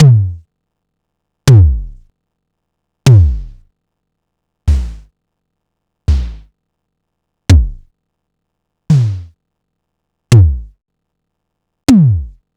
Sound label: Tama TS-305